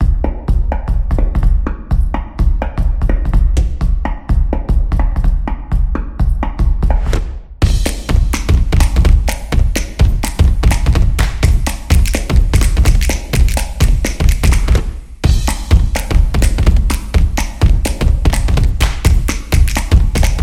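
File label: Guide, Street percussion background music built for sport, motion, and dance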